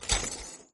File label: move; jocly; tafl